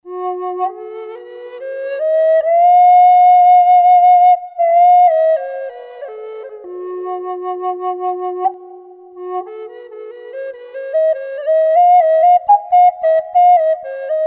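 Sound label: f sharp minor, flutes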